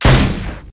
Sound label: grenade die